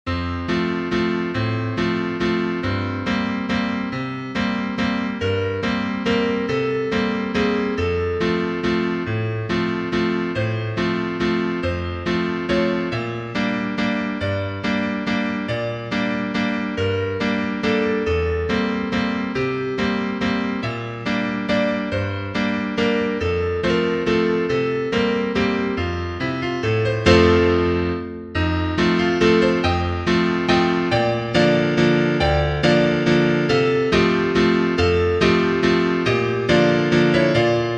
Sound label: accordion, sheet music